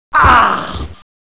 die forwards; gearbox; demoman

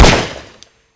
gun, weapons; cstrike

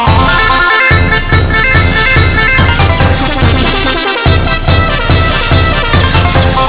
polka; tfc

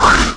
attack swipe; svencoop; aliens drone

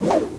swoosh

